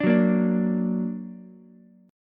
Bb Augmented Bb, Ukulele; strummed; JGuitar

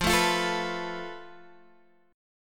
F Major Flat 5th, Guitar, Standard; strummed; 13,12,15,14,12,x; JGuitar

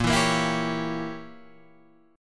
A sharp Diminished 7th Guitar Standard; 6,7,8,9,8,9; strummed; JGuitar

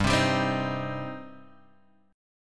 G Suspended 4th Sharp 5th, Gsus4#5 chord; strummed; JGuitar

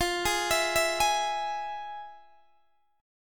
F Minor Major 7th, Guitar DADGAD, chord

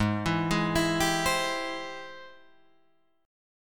Ab Augmented Major 7th Ab Guitar Drop D

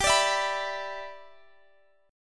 G 6th; G6 strummed; x,22,24,21,24,x

